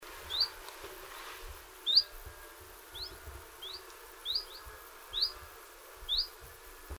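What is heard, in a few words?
Phylloscopus collybita, Col De Bretolet; 20.9.2003 Col De Bretolet, Valois, Switzerland; Linnut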